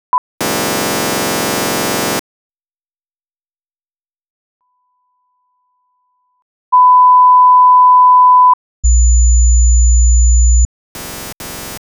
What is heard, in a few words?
Test signal; 192 kHz 16-bit